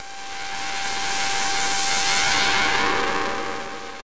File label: Alien Blast; Zero-G; Soundclash Nu Dance Electro; Acidized Wavs; FX Atmospheres